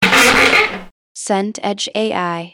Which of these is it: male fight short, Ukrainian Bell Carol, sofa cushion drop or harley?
sofa cushion drop